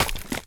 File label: Physics Object Toon Bridge Slice